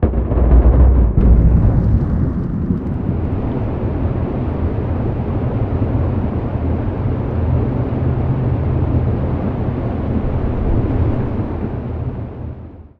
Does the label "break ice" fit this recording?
Yes